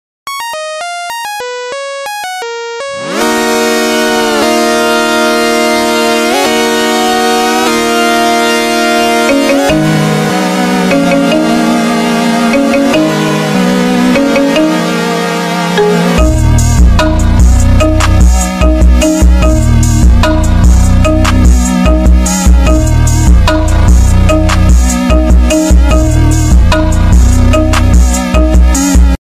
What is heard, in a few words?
Nokia Ringtone